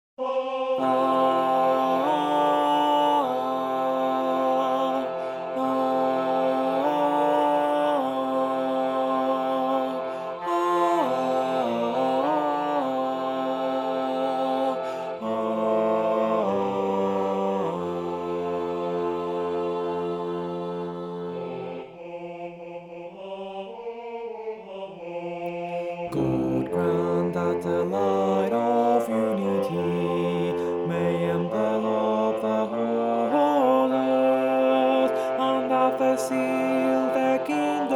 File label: tenor; god grant that the light of unity